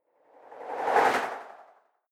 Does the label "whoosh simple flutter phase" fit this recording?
Yes